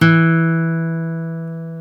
GTR nylon

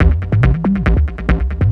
Beats, Industrial

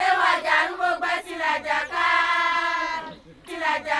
120 CHANT

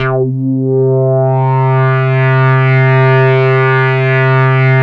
DIVE, ARP 2600; Big Fish Audio; First Call Vintage Keyboards

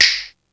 SNAP M1, DRUMS; East West; Global Sounds

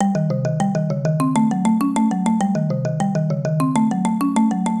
Marimbesque, 100bpm; Loops n Lines

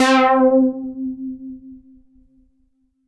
Synths Note C

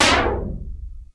Perc Hit, Noise

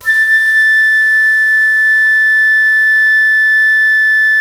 FLUTE MF A6; Greytsounds - Philip Wolfe David Crozier's Saxophones; FLUTE MF-PW

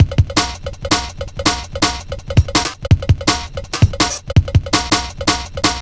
Bull Bell A, drum loops; Jungle; Jungle Warfare 1&2

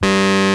Rhodes Fuzz G2; Mo' Phatt; Digital Sound Factory Mo' Phatt

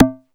CONGAS, DRUMS; Music Store Sound Service; Einstein

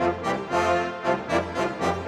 Brass; Sonic Foundry (Sony Creative Software); Orchestral VOL-2; Modern 31

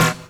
Snare FX layer; Kick Snare Layers; Drum Bits; layering

